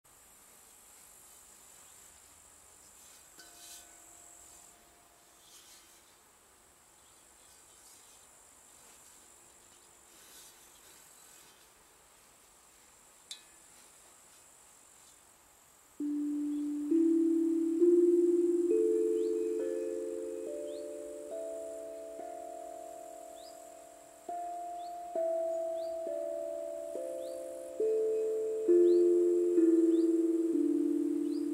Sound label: D Pygmy pentatonic scale